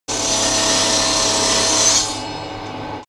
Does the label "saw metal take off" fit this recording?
Yes